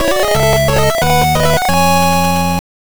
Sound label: Unused Jingle; sutte hakkun; gb